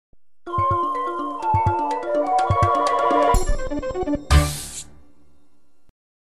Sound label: Stage Beaten; hotel mario re pooped; Ludwig's Thump Castle Hotel